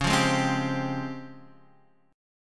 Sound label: C sharp Major Sharp 11th, Guitar, Half Step Down